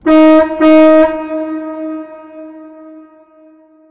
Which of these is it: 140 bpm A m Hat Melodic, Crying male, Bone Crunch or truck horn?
truck horn